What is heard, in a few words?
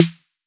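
808 snare drum; Electronic; Wizoo - Platinum 24 Electronic; CHR SD 808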